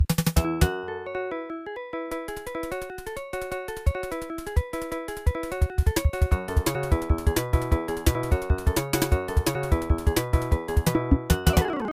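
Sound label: background music; Block Block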